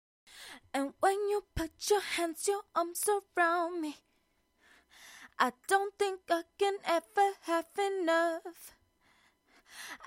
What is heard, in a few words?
pop rnb african Em 100